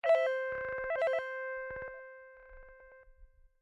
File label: call waiting